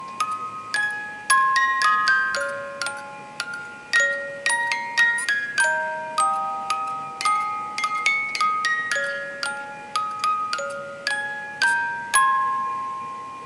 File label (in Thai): กล่องดนตรี; We wish you a merry x mas